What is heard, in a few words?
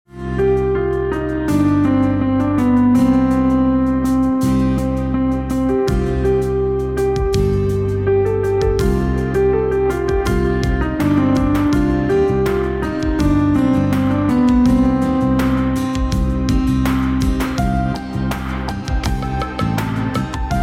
instrumental ringtone